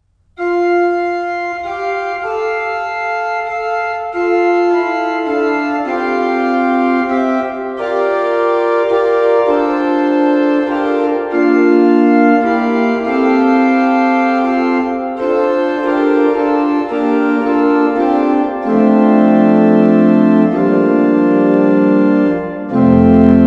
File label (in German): orgelvorspiel; Hörbeispiel "Großer Gott, wir loben dich" GL 257 / GG 380